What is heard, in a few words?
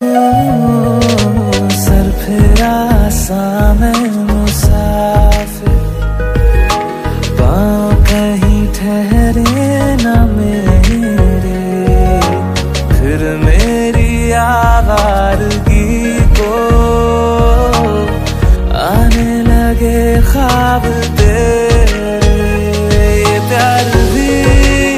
hindi ringtone